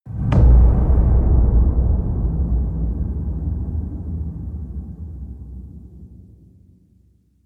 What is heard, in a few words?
hit hor surging hour no chime